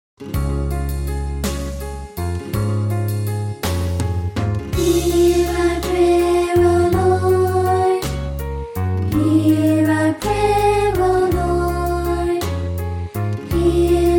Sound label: Childrens Choir